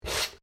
MALE SNIFF; Male Vocal sounds; Sick (Sound Effects:Human)

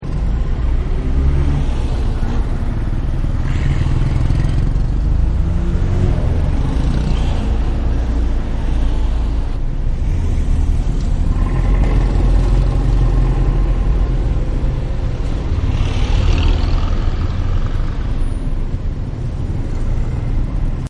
Traffic Industrial; Traffic And Cars; Backgrounds

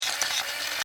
Scifi Servo Mechanical Robot Small Motor Toy

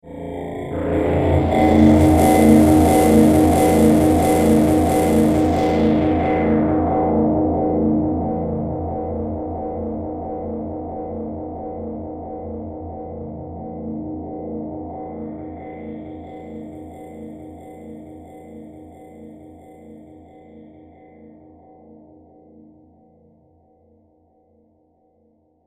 Alien Invasion Transition; Alien Sound Effects; science fiction sound effect; star wars / trek style games

